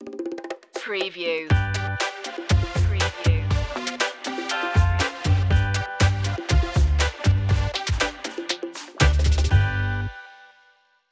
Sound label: funky electro stinger